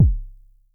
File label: K 808 ish, Vermona Mono15 Drums Kicks